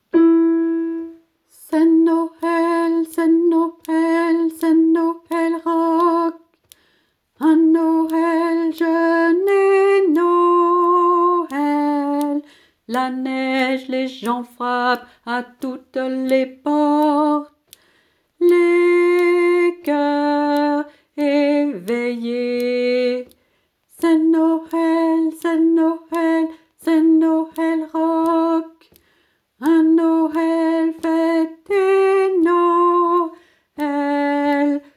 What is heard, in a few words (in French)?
c'est Noel rock alti chante